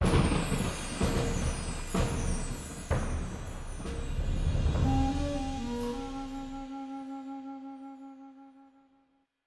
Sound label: unlock wind; EyeToy Play Hero; PlayStation 2